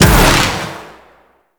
Shot, Weapons, Sentient; Warframe; Shedu

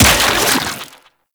Rifle Charged Fire; Warframe; Infested; Quanta

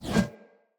One Handed Axe Whoosh; Weapons; Enemy; Axes; Sinecure One Handed Axe

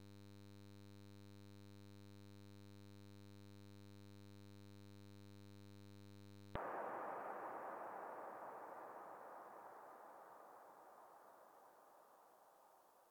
Sentient End Blast; Warframe; Sacrifice; Sacrifice Shrine Intro; Cinematics

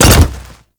Pet Land; Alad V

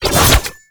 Bayonet Melee Combo

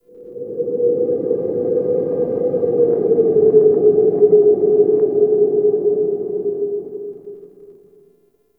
Interior Wind Howl; Ambience; Duviri

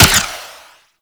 Flare Launch; Archwing

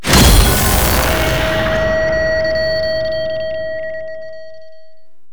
Painting Complete; Gameplay; Warframe; Shadowgrapher

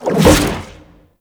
Aqua Board Jump; Warframe; Yareli

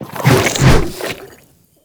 Strike Flesh, Proto Infested Ancient; Warframe; Abilities

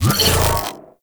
Leap; Venus; Terra Shockwave Moa; Abilities; Warframe

